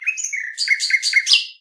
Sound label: nightingale